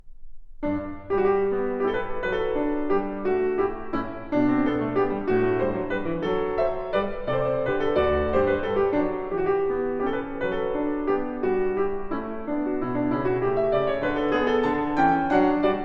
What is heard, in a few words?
Partita No. 5 in G Major, Passepied; BWV 829; Bach; Partitas, BWV 825-830; Igor Levit